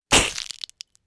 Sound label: flash bulb